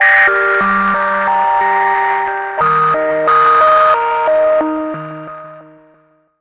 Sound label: crunchy pretty synth